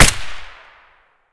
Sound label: sks silence 3p; Tacz1.1.5+; RSWS 1903